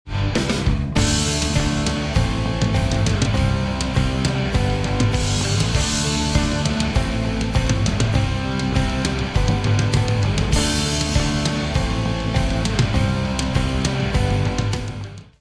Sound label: rock